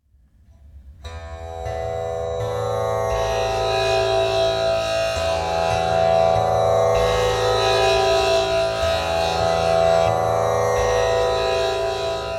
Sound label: Tamboura A